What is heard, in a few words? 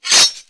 drop shorts